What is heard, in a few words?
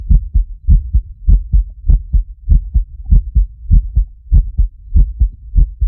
heartbeat stress; phasmophobia